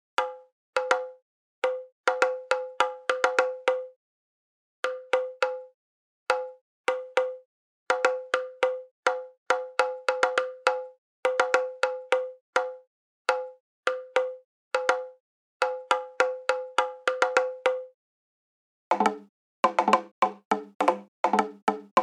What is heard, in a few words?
DUMPLIN DRUM